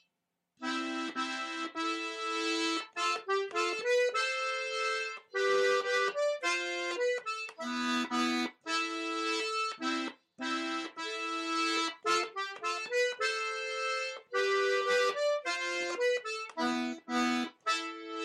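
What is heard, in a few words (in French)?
jenkka 2eme voix, accordéon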